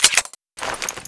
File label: clip out, weapons, deagle; garrysmod; fokku tc